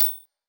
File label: marimba